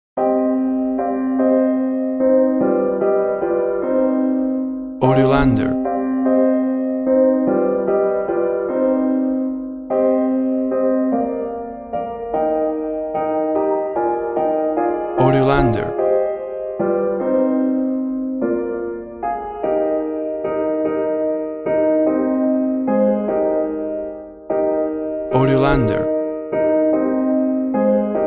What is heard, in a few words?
We Three Kings Soft Piano